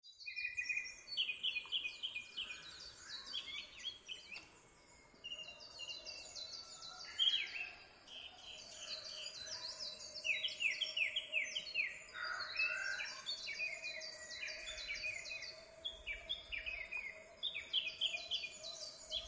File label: forest; nature